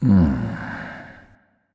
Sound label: nv sigh; smite; xbalanque